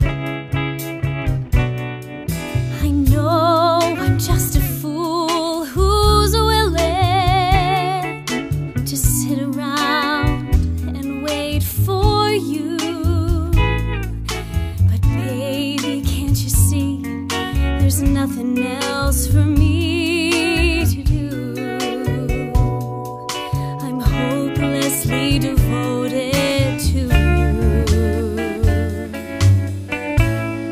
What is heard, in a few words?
guide vocal